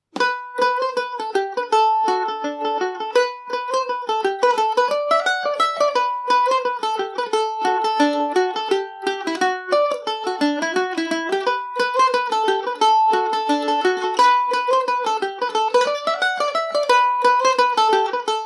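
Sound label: E minor, Irish mandolin; brendan mcmahons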